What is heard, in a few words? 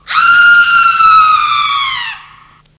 Scream